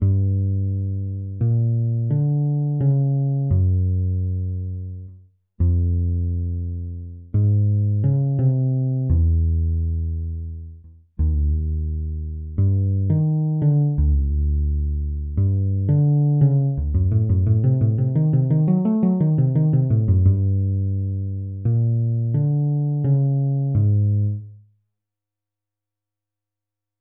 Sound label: Bass Practice Music; Legend of Zelda The Majora's Mask; N64